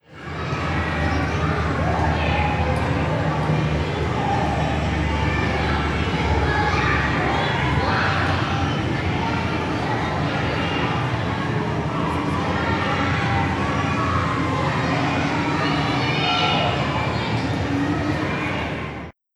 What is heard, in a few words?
Paris Kids